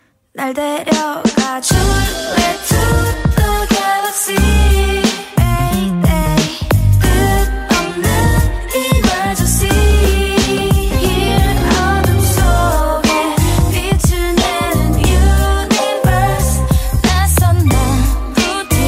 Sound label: Galaxy chorus